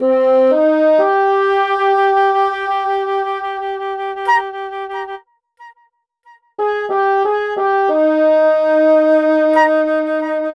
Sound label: Flute Bassoon Combo, Cinematic Funk; Sonic Foundry (Sony Creative Software); Cinematic Funk Urban Tone Poems